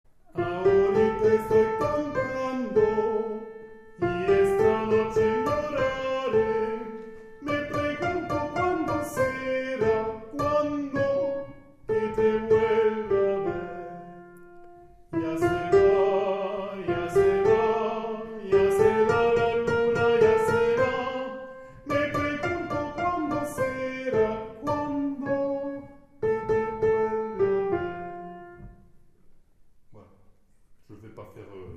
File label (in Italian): Soprani